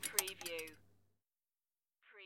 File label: Pinball sound, small metal plates rattle